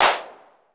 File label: ROOM CLP; Crypton - DrumBase5000; R70 KIT #2